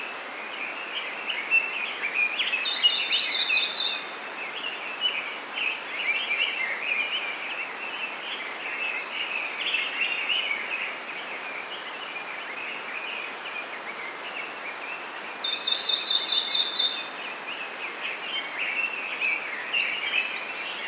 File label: Red-billed Leiothrix